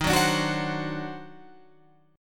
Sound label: Eb Minor Major 13th, Guitar, Open F; EbmM13; strummed; JGuitar